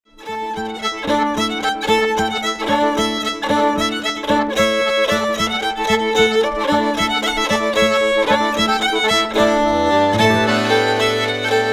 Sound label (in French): marchoises